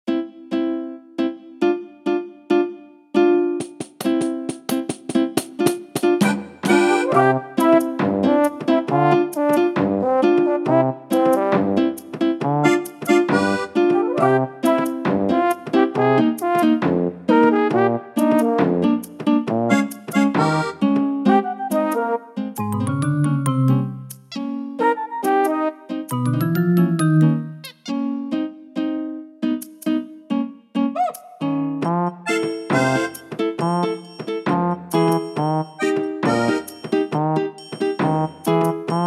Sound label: Carnival, No SFX; Animal Crossing New Leaf